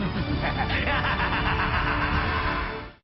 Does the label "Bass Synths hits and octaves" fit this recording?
No